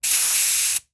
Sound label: Industry Tool Air Compressor Blow Air Quick